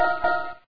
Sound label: beep kind